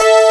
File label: DG WAVE, DIGI SYN